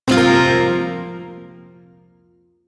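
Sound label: Shawzin Pentatonic Minor Chord; Warframe; Duviri Shawzin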